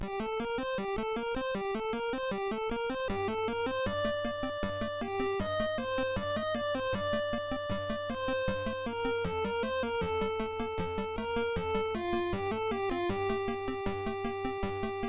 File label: intro sb 1.5 ct1320 (cms); Creative SoundBlaster 1.5 ct1320(CMS); soundcard test; silpheed